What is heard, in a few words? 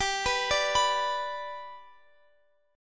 G Major down Guitar Open F; G arpeggiated; JGuitar